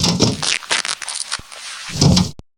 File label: Medikit